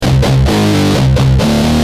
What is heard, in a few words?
G Jam, Guitar; Industrial